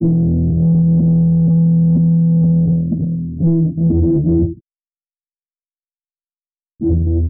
bass, Loops Phonky

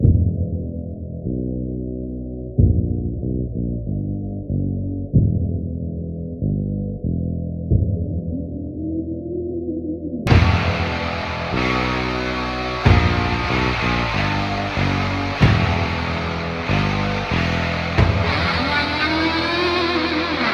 guitar

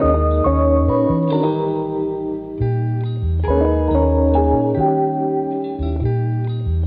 keys

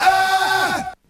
"ah", Voice

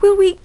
"hoo wee", voice; ooh ahh; ho hoo woo

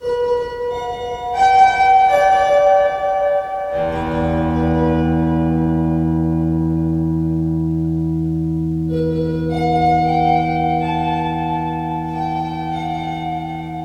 guitar, Sad

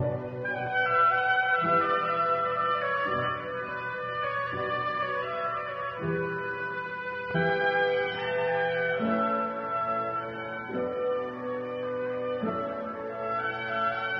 sax, sad

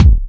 cuck, Kicks Loud

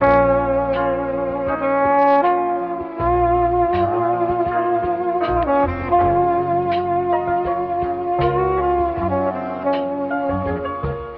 sax and piano, sad; loops

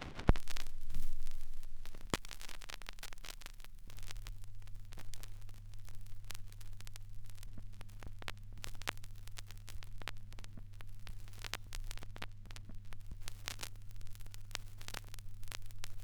crackles, Vinyl

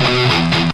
heavy metal

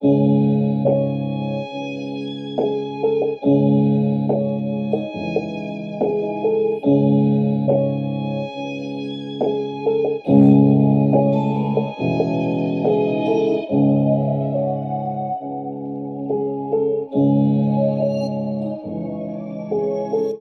keys brooding; Loops; Sad

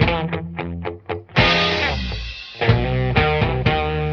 guitar, Loops Phonky

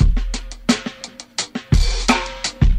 Drum loops, Funky

